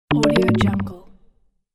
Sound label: arp chime notification